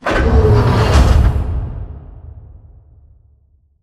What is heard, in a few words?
Robot Arm Fold Plate; Boomtown; Titanfall 2